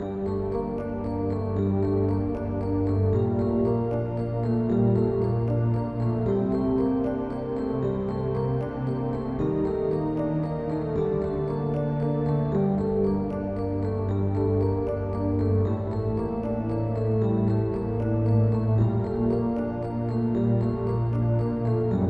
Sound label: Tense; Fran Bow